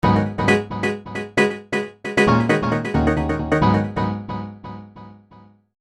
piano

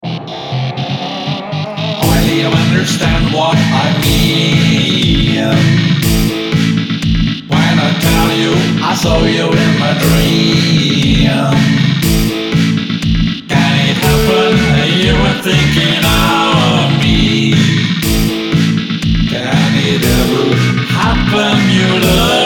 say yes disco

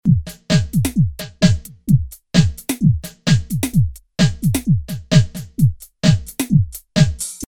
beat

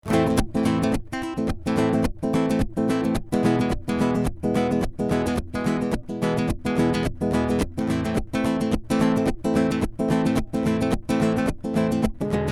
git pickup

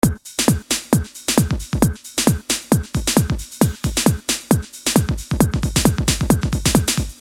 drum 4floor

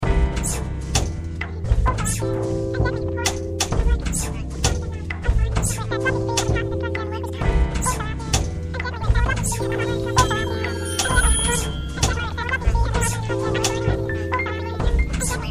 loop full 65bpm

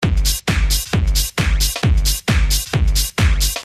techno drmlp 133

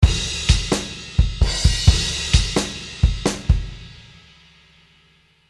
130bpm drums

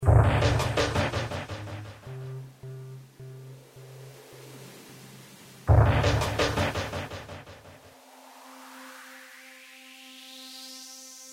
dru

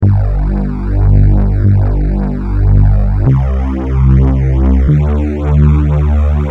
strings

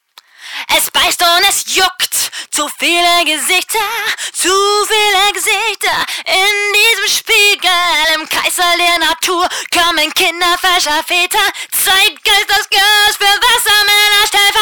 neon vox, drive

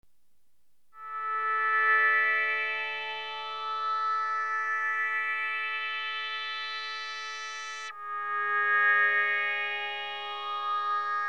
sweeep 138

